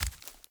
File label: Walk Veg, Footsteps; Characters; Enemy; Mockeries